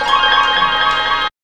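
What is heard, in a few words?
PIANO FX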